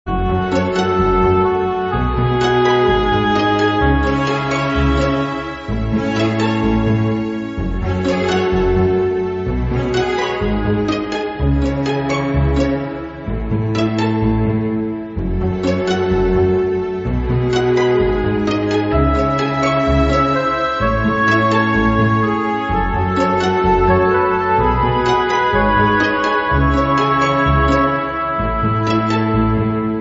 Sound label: Spy cello vs spy pizzi; Copyright (C) 1984 First Star Software Inc.